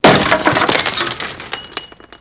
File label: Glass Break Impacts; clay & ceramic